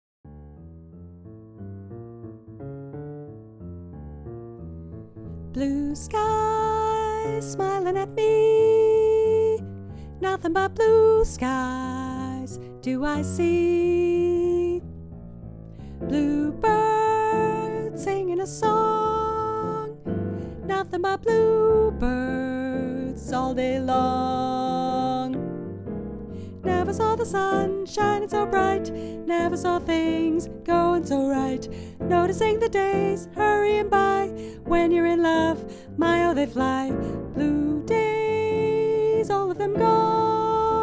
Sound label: Alto